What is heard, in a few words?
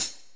HI HAT